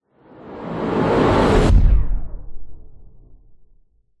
sound design whoosh fast powerful into low frequency thud tail; zapsplat